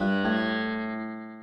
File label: ETHNO 125 A